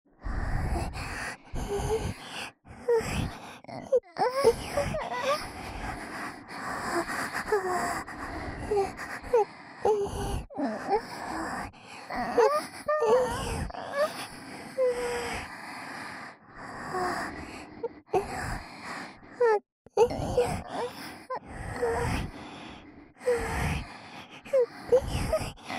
両耳元でW喘ぎ

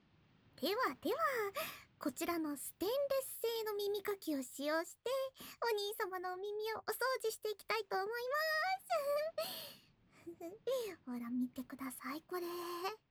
ステンレス耳かき; 星宮のステンレス耳かき; ASMR

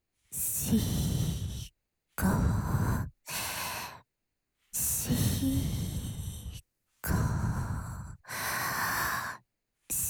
手コキ（しこしこ・右）（SEあり）手交

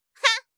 ふんっ; ASMR; 相づち・反応系【ネガティブ】

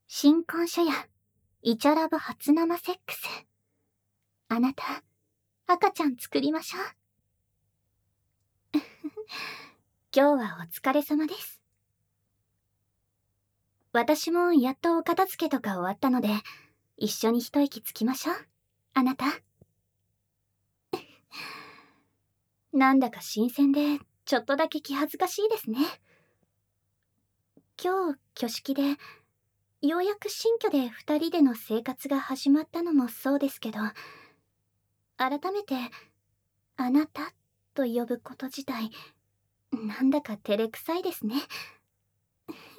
新婚初夜、ベッドに入ってドキドキの誘惑キスと耳舐め SE無し

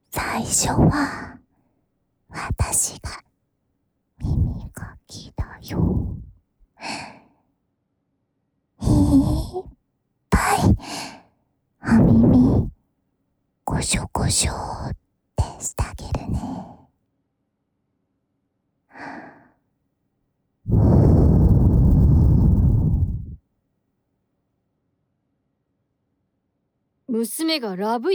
耳かき＆ハンドマッサージ

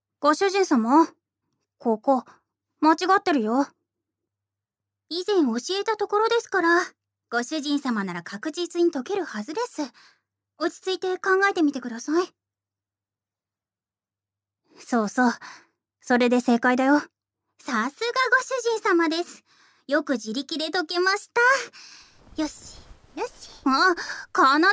耳舐め; 二人でご奉仕？ 耳舐めでイク

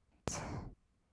"つ", ブレス, 吐く音, kawaii voice; ASMR; 1200 kawaii voice!!

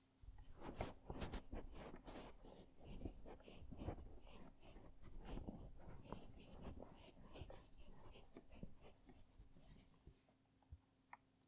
絹連れ; ASMR

